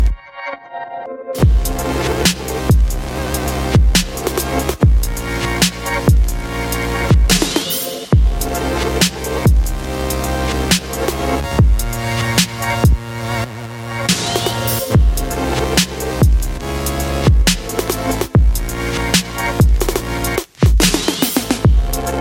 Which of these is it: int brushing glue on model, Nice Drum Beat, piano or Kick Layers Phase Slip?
Nice Drum Beat